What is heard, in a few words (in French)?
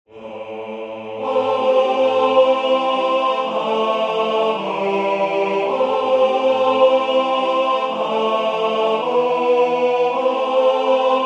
alto tenor; En toi Seigneur mon esperance